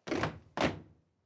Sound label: step crowd march loud stop; schritte